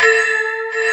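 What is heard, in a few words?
ambient pad, synthesizer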